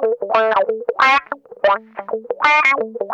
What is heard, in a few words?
TIMER, Funk Guitar; Zero G - Funk Guitar